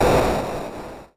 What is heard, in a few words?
burst